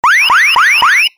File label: Price Down Effect